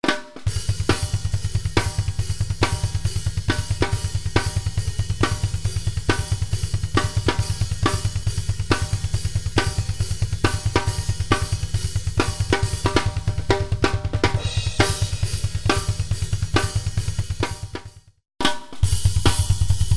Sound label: Drum Groove A